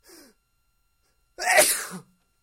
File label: sneezing